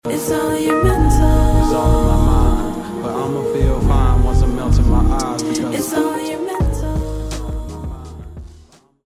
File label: deathcam